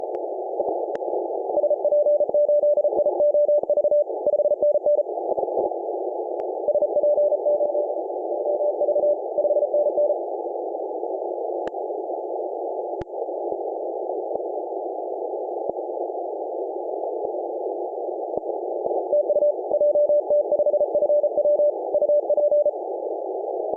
Mongolia on 18mhz CW

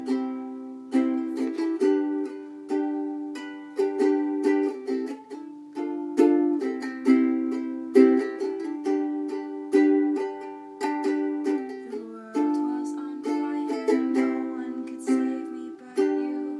ukulele

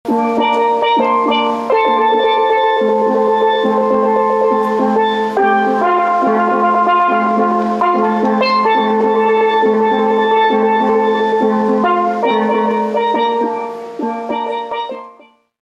steel drum band; kicks off ceremony to honour bob marley

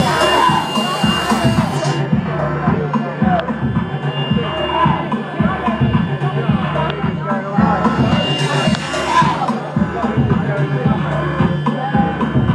computer techno

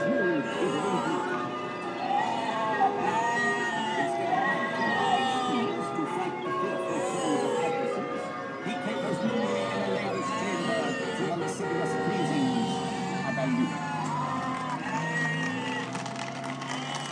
miniland at legoland soundscape; cornwall

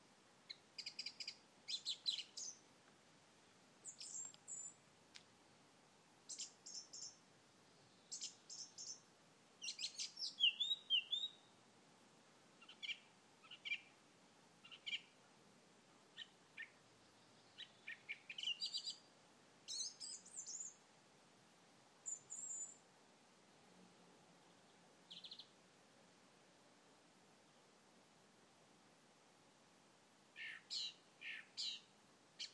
birdsong quiet evening

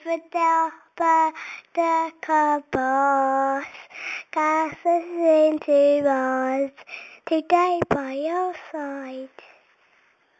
singing again

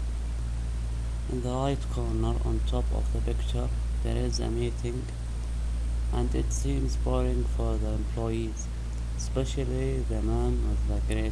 office

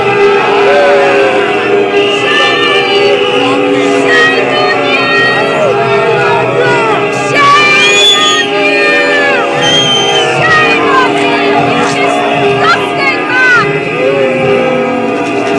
what lots of people booing sounds like

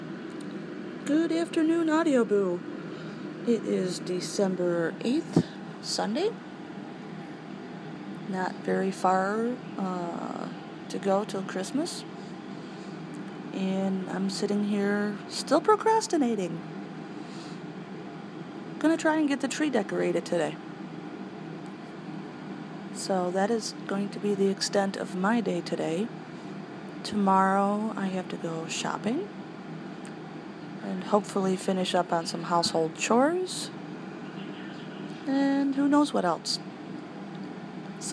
sunday background noise

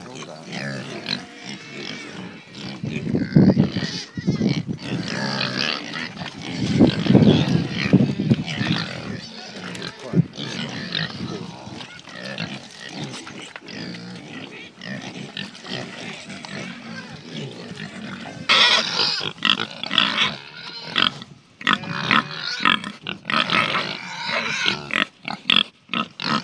feeding time

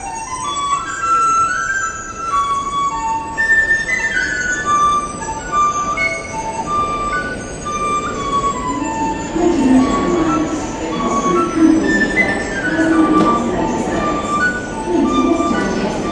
sounds of piping busker at euston tube station

